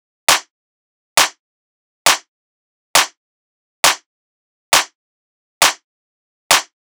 Clap Loop 135, Techno; Drums; Ghosthack; Techno Sounds 2025

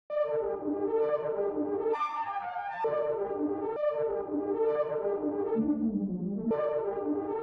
131 BPM D Min Arpeggio; Loop Stems; Cymatics; 2022 Melody Collection Platinum Expansion - Pt 2; Triumph

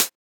Closed Hat; Ghosthack Advent Calendar 2017 Day 5

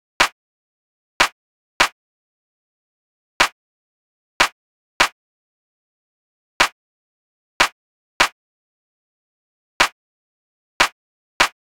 Drum Loop - 150 BPM Clap; Loop Stems; Cymatics; DOPE DRUMS VOL. 1; Worlds

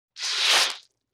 Wet Squoosh; Vintage Cartoon; Hits & Tones

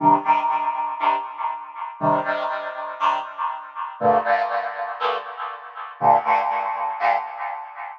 Dub Chord Loop Cm9 120, Dub Techno; Ghosthack; Dub Techno & Deep House Chords

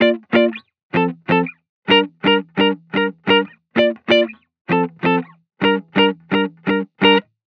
Guitar Chord Loop, 128 BPM G min; Instruments; Loops; House

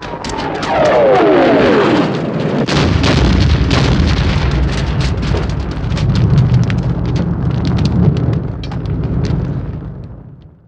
fight scene, Cartoon Film Effects

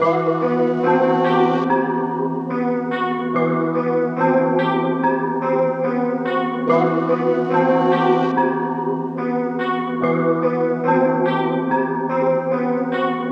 144 BPM E Min, dark hip hop melodies; High Ground

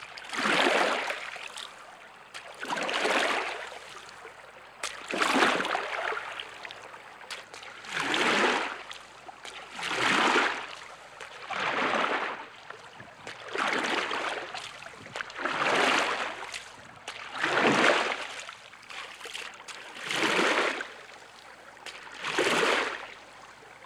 Rowboat Movement; Vehicles; Watercraft

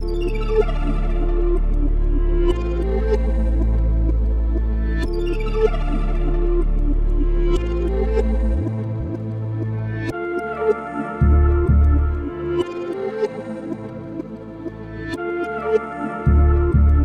95 BPM D Min, Melody Loops; Space; Cymatics

